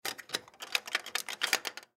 Arcade Game Coin Return Door Push Open Feel Around; Arcades; Blastwave